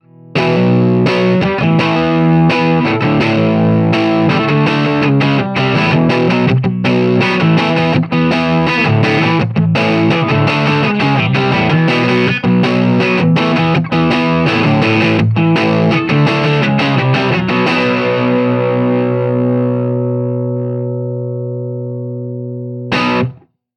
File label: 18W DIRTY 6V6; 18 Watt v6; Marshall G10